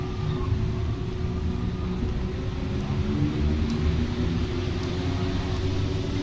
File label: acceleration, Metro